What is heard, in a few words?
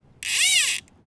Fishing Reel; UltimateFishing2020